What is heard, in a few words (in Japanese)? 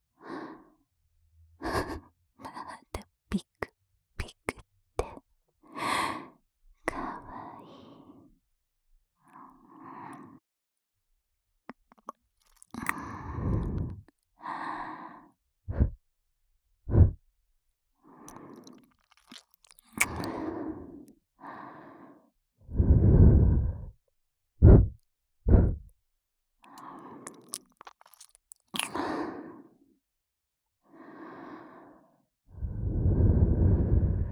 右 耳ふーと耳キス